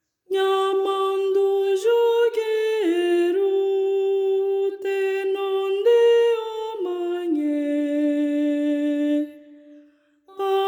voz guia; Nhamandu jogueru; O Pequeno Cancioneiro

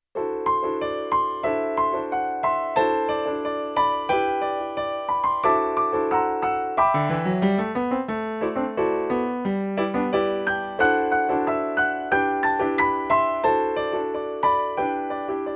PIANO; O gatinho

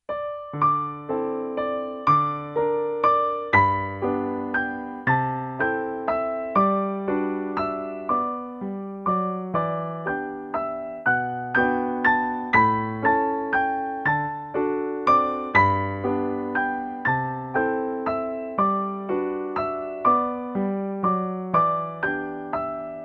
Ciranda das flores PIANO

